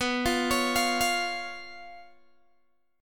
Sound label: B Suspended 2nd Flat 5th down Guitar Open G